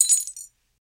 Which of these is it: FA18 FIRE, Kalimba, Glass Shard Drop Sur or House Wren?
Glass Shard Drop Sur